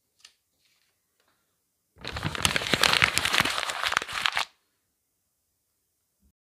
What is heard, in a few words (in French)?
froissage d une feuille en papier